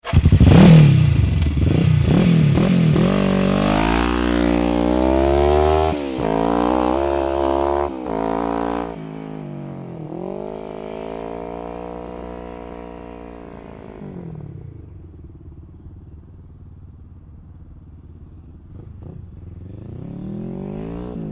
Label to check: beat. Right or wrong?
Wrong